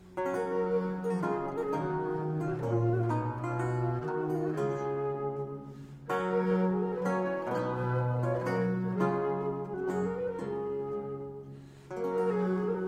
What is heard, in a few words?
Viola DA Gamba Vihuela DE Mano